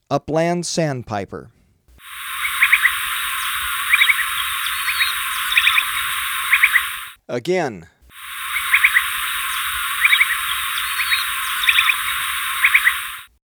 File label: Upland Sanpiper, Birds of Ecuador; S. America